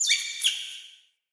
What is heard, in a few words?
Vocal Double Squeak, Animals; Player; Player Floppet